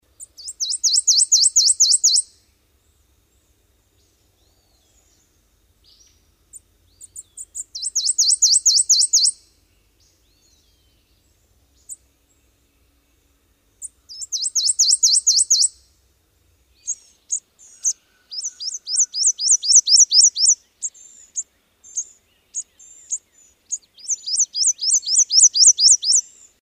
Prothonotary Warbler; Lang Elliott

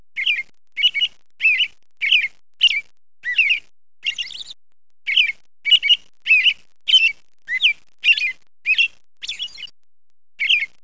american robin

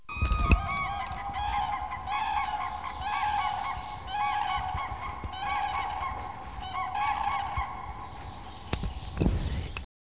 Eur Crane

